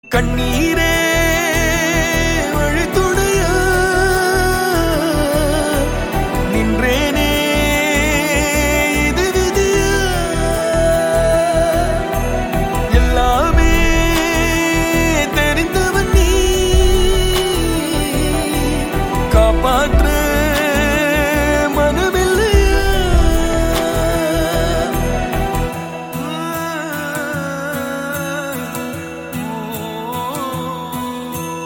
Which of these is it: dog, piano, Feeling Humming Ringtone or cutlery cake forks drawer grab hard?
Feeling Humming Ringtone